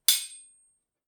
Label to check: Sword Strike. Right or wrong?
Right